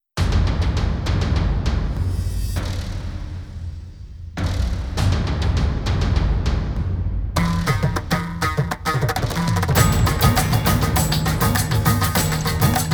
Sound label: Instrumental; Tamil; Sivakarthikeyan; Maan Karate (2014)